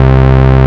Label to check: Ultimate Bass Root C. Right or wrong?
Right